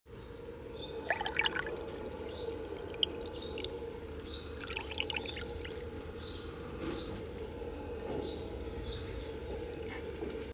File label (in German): Meerrauschen plaetschern